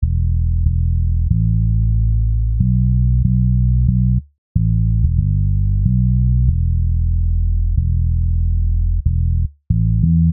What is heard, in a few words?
Bpm 93 E Bass; Basslines